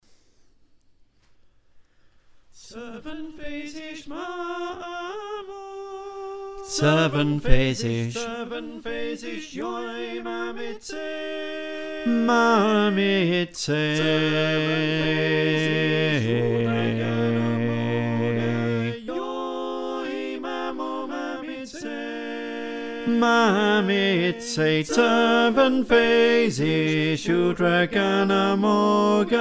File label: Bass, choir